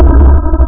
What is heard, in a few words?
player fire; asteroids2